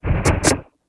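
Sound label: Starship Force Field Effect; Starship 1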